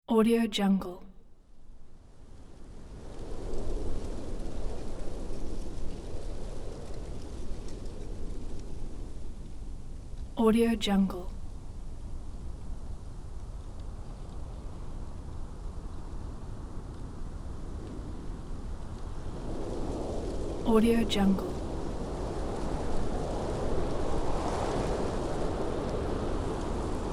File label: wind in leaves